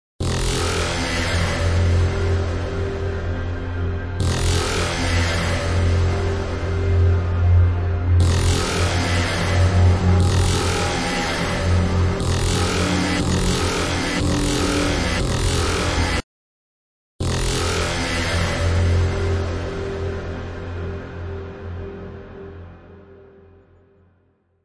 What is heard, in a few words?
Gouger Texture Only; Ninja Tracks